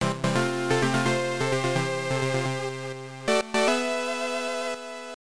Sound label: Game Start; Konami's Boxing; MSX; SCC